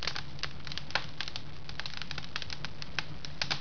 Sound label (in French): feu